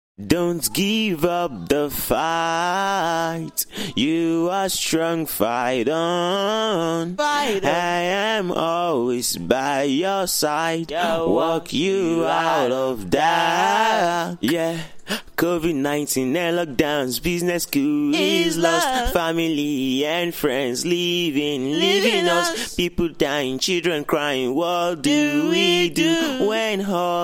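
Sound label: pop rnb, 129, F major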